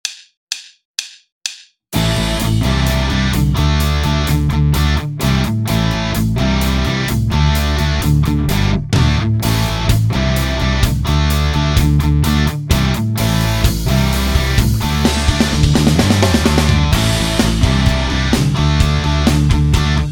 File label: Hard Rock, Hard Rock Guitare